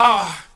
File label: "ah"; ooh ahh